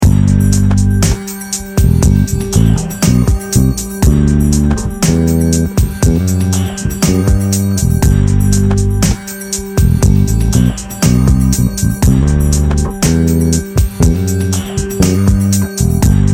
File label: Ionian Groove, bass; Ionian mode